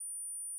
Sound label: Gauss Filt G7